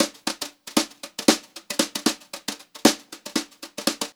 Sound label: Brushed Drum Loops, JAZZY, 116; USB Soundscan vol.39; AKAI; 90 s Sample CDs